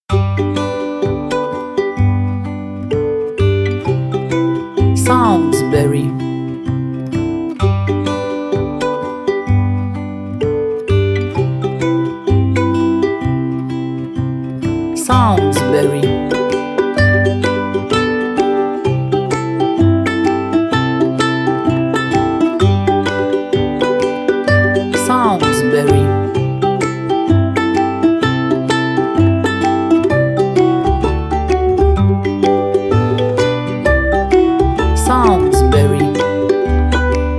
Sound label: Ukulele